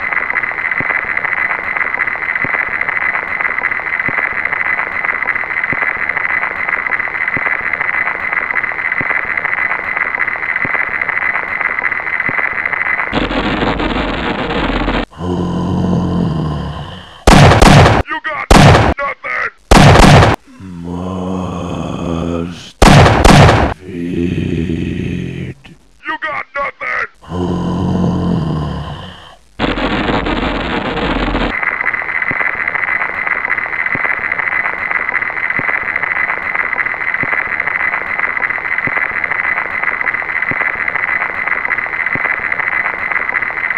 static; brainbread

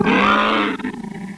voltigore die; svencoop

